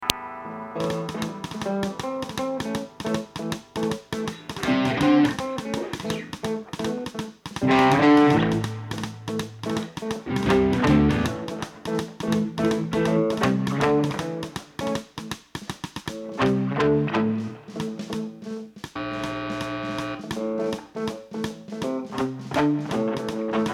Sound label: Ambient Guitar Mix